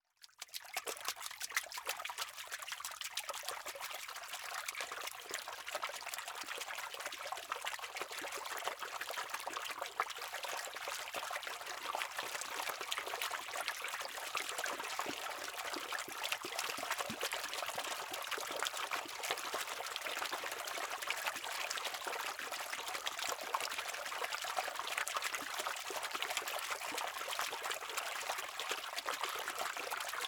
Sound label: Liquid Water Hands Splashing In Water